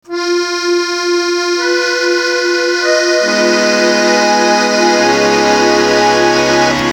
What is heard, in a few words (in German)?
Tristanakkord